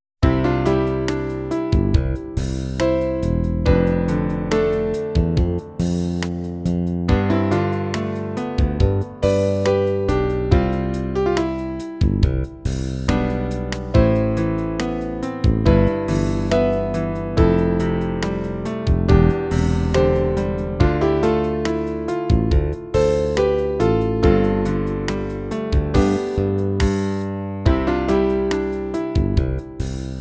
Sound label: SYNTHE; kenshuui tend dokuta original soundtrack